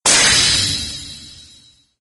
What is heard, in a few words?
attack